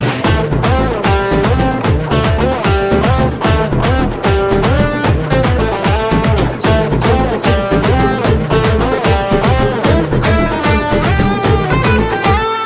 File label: Jazz